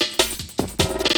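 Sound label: loop snare drum, 150 BPM, drum & bass; Ueberschall; Drum & Bass Resonance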